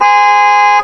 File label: Honk; 暴力赛车